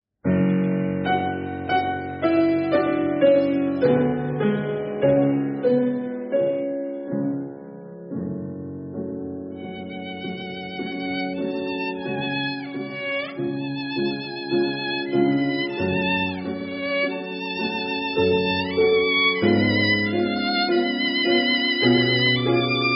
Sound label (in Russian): скрипка; Дж. Верачини; Ларго